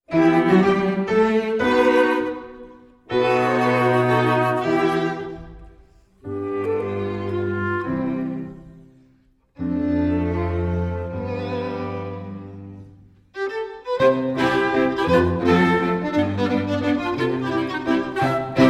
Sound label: Mazurka Quadrille; Cellarius